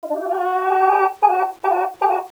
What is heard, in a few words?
hen stand; dm melancholy